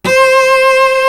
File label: solo cello; East West; Global Sounds